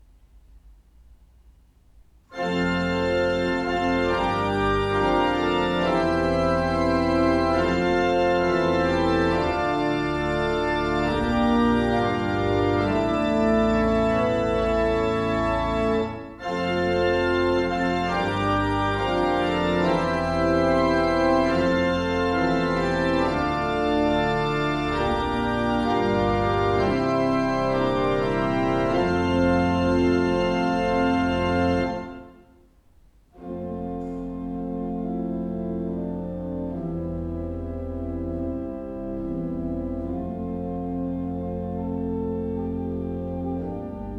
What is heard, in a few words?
Fanfare